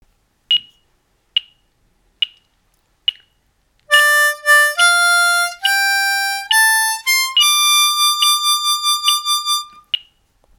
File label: Third position ascending